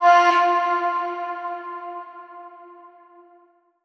Omnivox oneshot F, Vocal; One Shots; Slate Digital Omnivox Vocal Sample Pack

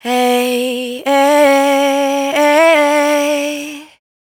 riff Hey Ay Ay 90 Bm, Vocal, Dry Vocals; Raw Vocals; Slate Digital Omnivox Vocal Sample Pack

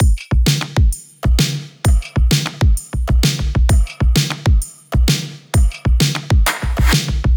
drum loop 130; Pop; Drums; Full Drum Loops; Slate; SD PASADENA Pop Sample Pack

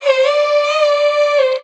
vocal prelude Bb; SD AURORA Sample Pack; Slate

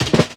perc spring, Drums, One Shots; Slate; AURORA Sample Pack

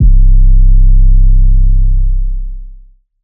808 candy C, Tonal; Hip-Hop; Slate; CAICOS Hip-Hop Sample Pack

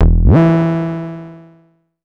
Synth oneshot Glide Up E, Synth Bass; Synth Bass One Shots; Slate Digital BASSHOLE Sample Pack

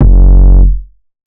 808 one shot Iron F#; Slate Digital BASSHOLE Sample Pack

